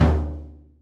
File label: long room reverb tom drum single hit d key